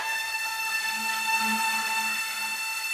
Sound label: air horn